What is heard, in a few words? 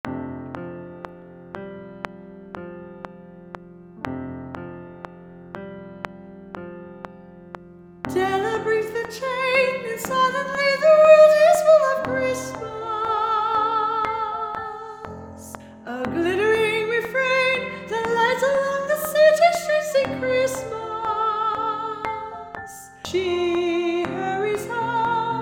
soprano